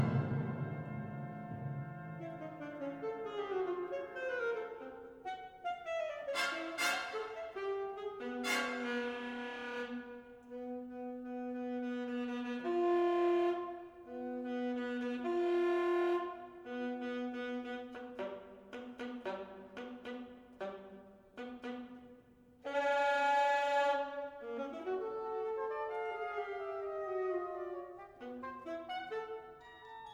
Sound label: Altsax solo; Mästare alle söka dig; Saxofoon en orkest